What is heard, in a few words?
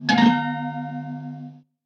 Low Battery